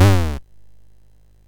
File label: sid MOS6581R2; California Games